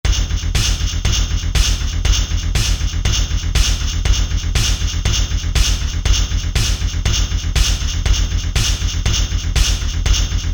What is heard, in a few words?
Industry